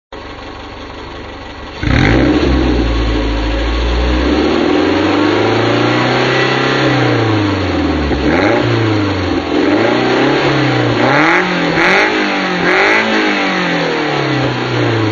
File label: tm park, exhaust